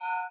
hit purple; zombie riot; btd

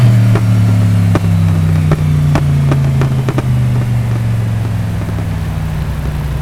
revdown; nfs heat ganimator pack

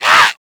kid zombie stun; nmrih; childrenofthedead; zerosiki; slender

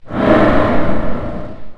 alert; slender; batman visional

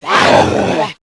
kid zombie alert; nmrih; childrenofthedead; zerosiki; slender

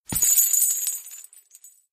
footstep; chriswalker; slender

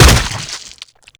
hit; scarlet; slender

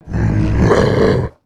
alert, male zombie; nmrih; zerosiki; slender

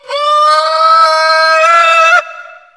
baby alert; slender

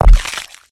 footsteps, run

